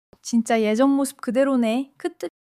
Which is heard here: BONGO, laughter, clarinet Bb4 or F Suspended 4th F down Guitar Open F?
laughter